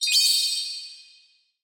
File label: flash